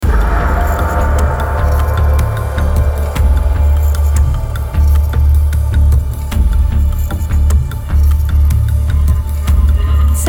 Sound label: Ambient Suspense Approaching the Edge